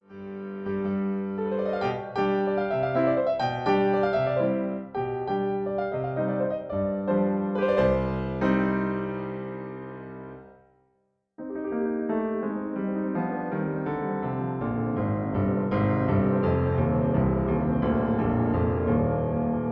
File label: Accompaniment C; Op55 No7